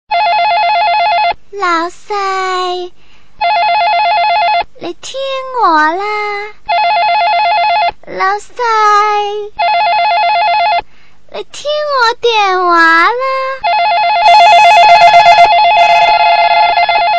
desk phone ringing ringtone

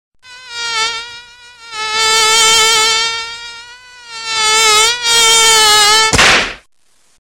funny mosquito ringtone

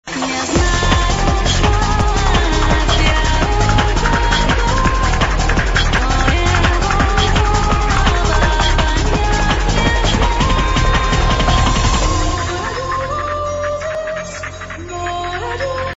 speed trance mix

